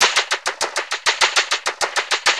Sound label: Vocobeat; Sonic Foundry (Sony Creative Software)